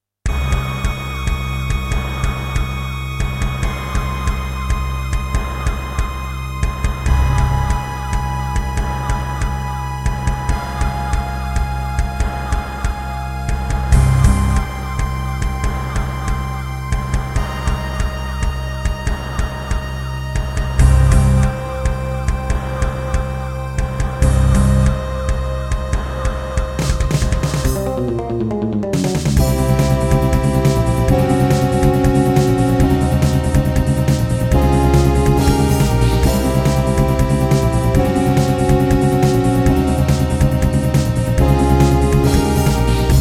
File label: Yamaha DTXpress; sigil doom episode 5